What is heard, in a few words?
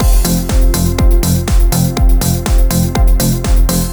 loop